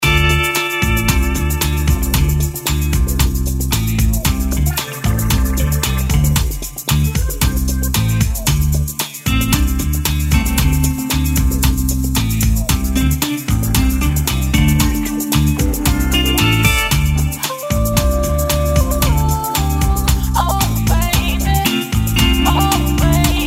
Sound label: MOTOWN GROOVY GUITAR